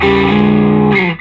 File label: SOLO E, Vintage Blues Guitar